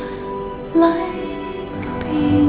female laugh

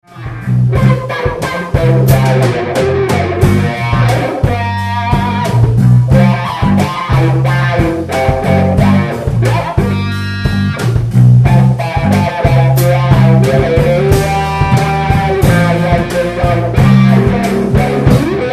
G Boogie Jam; ax84

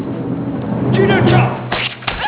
light saber

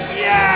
car horn

